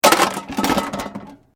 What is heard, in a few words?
Metal Light Movement Minor; Materials